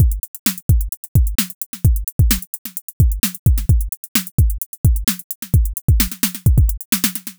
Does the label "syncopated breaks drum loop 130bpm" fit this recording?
Yes